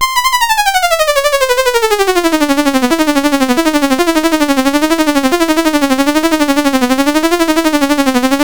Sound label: Flight of the Bumble Bee BASIC, CSG8580R5; Latimer Joey